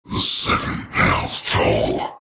shards death; terrorblade

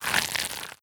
move, movement; medusa

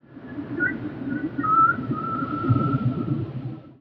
cetaceans; ambient soundscapes; dota

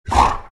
grunt, greevil; npc; courier

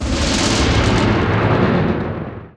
glimpse begin; weapons; dota; disruptor

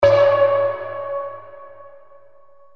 crystal; meepo; chorus

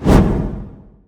preattack; dota; ember spirit

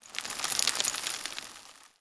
debris; techies

